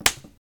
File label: Face Slap